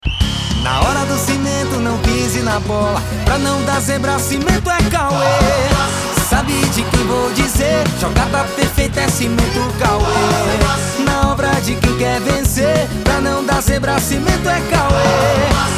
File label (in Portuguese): JINGLE FUTEBOL